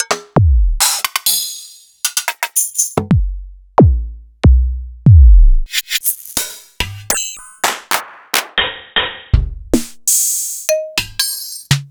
EMU Orbit

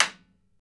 SURDO, Brazil Drum Perc; E-MU Producer Series Vol. 7 – Old World Instruments; Drums&Percussion

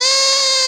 Duck call, cartoon Foley Fx; musicradar; cartoon caper

